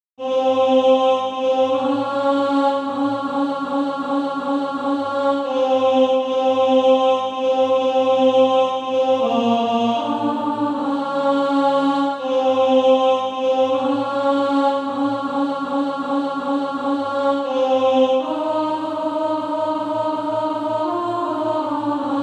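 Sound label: alto, SATB Practise Music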